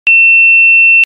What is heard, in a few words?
Sine 2700Hz